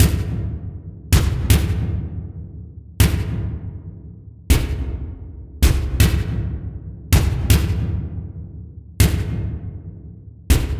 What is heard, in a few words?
drones subterranean dry; aas; objeq delay